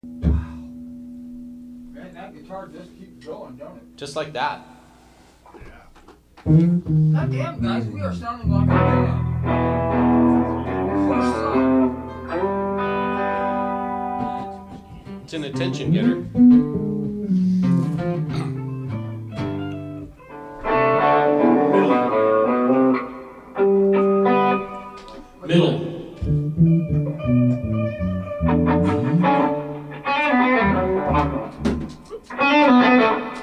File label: Background Mix